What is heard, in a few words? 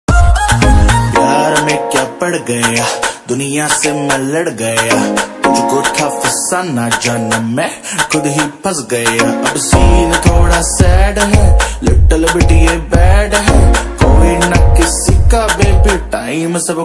hindi rap ringtone